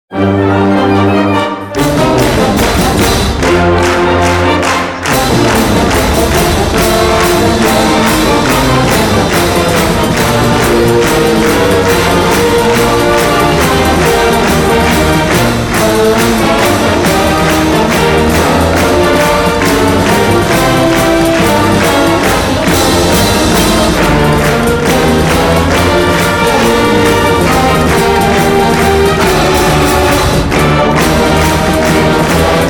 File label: Fight Song; WVHS